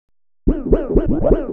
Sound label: HOUSE FX 125